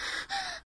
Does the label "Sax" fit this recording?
No